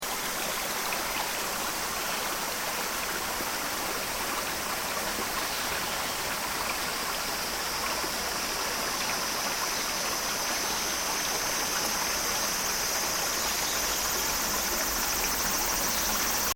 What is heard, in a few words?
Ambience Takao Stream Insects, Mount Takao west of Tokyo; Forests and Jungles (Sound Effects:Backgrounds)